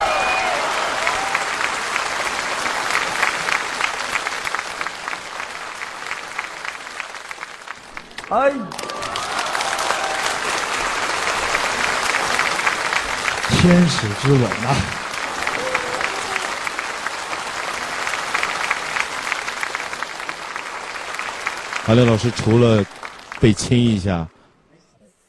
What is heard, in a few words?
sad ringtone